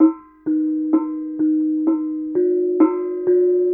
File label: KENONG; Spectrasonics; Heart of Asia; KENONG PH